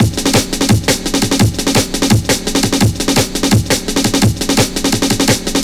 Some Drum, Drum & Bass; Fluid Dynamics

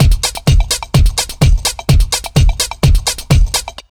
BEAT, Disco House; USB Soundscan vol.20; AKAI

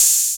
HH 808, TR-808; 90 s Sample CDs; Sound & Vision - Gigapack I CD 1 (Roland); TR-808 & 909

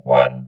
VOX VOX, Electro Vox

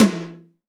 R-8 power tom; Drum Mashines; EdgeSounds; R-8 DRUMS

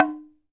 OKAWA, JAPAN PERC; AKAI S6000 CD-ROM; WorldSounds

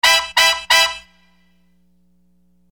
Horn Loop, Hip-Hop

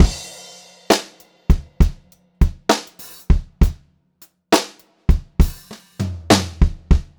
Pop-Rock Drumloops, 100; USB Soundscan vol.37; AKAI; 90 s Sample CDs

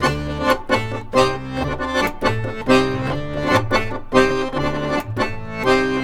ACC, Balkanish Traditions; USB Soundscan vol.63; AKAI

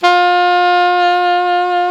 SAX ALTO MP, Alto Tube; Roland LCDP07 Super Sax

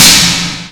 k1, Kawai K1 Drum Machines; 300 Drum Machines

